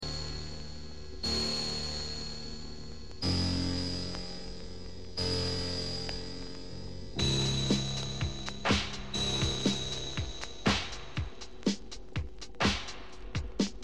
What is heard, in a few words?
jazz